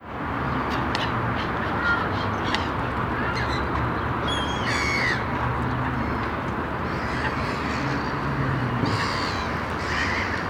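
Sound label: Perito Moreno glacier Lake gulls; Pedromorenoglacier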